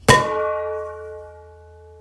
Pan Hit

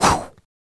Wind Swish Swoosh

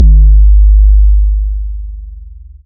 Kick

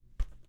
Ball Touch

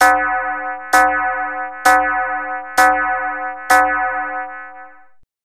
Bell Sound

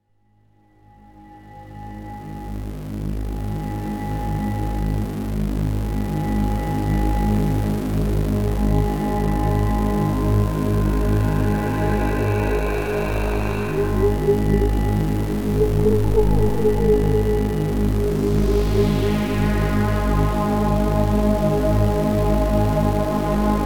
Alien Moon

